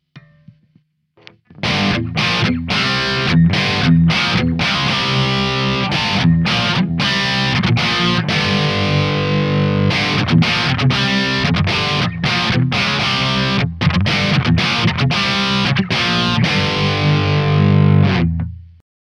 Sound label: backing